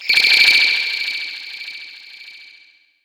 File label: TRAP TRANSITIONZ